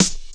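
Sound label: SD -R, snare drum, Drum & Bass; Ueberschall; Drum & Bass Resonance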